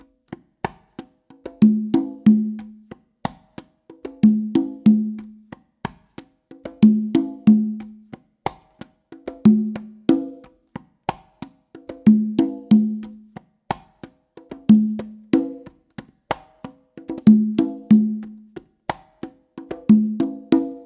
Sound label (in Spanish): bolero 46 congas